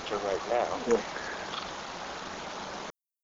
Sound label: cemetery